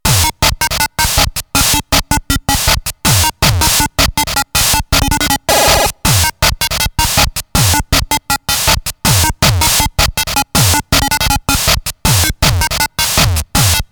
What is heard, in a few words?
gbstudio uge tracker beat; weeklybeats 2026